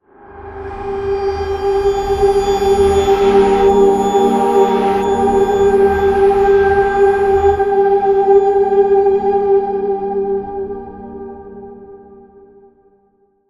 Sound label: String; assassin s creed unity gamerip 2014